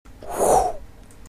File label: woosh